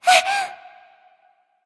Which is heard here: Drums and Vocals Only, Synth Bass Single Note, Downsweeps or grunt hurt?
grunt hurt